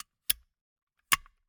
Gumball machine, candy door, plastic, open, shut, wide; Eiravaein Works; Vaeyan IV